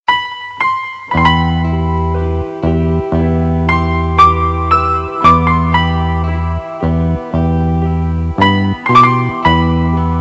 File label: lovley piano; classical